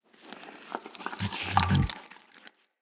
Battle Dog Lick; Animals; Vocals; Snc Battle Dog

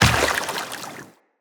Step Water, Footsteps Walk; Characters; Tenno

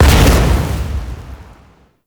Spin Beam Explosion; Enemies; Weapons; Warframe; Tau; Sentient Dactolyst

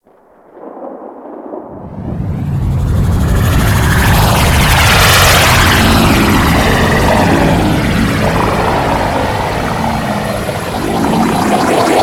Liset Hub Docking C; Warframe; Ambience

